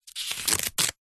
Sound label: foley raffle ticket book ticket rip out performated slow; zapsplat